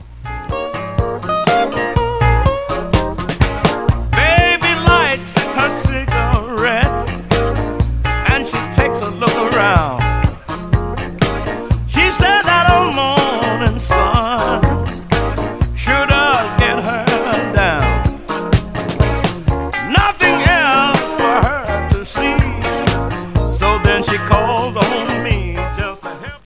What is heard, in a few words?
happy birthday blues